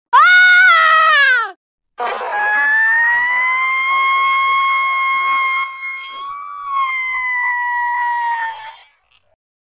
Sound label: female scream